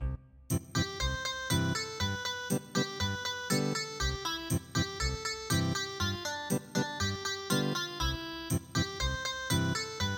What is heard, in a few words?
Ethnic Guitar, traditional